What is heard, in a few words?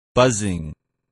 buzzing